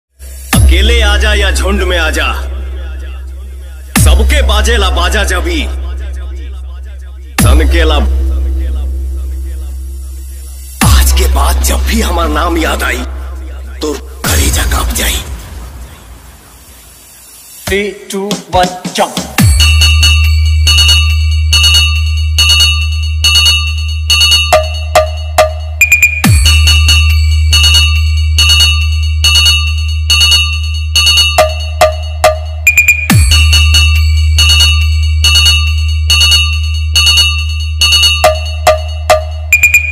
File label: Temple Bell Vibrate X Train Horn X Police Sairan Beat; DJ Aman Production